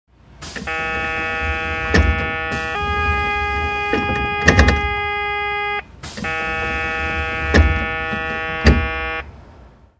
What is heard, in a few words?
door close & open